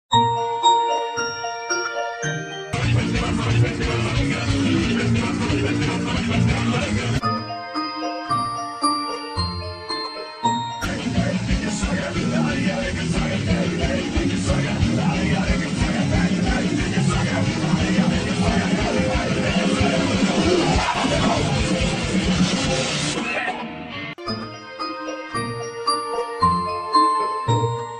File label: Twinkle Metal